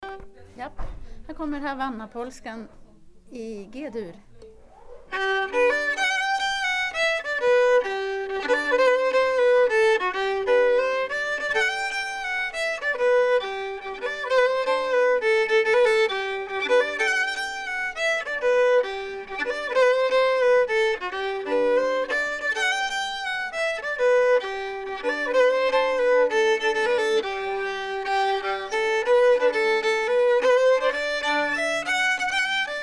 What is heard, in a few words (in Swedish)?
Havannapolska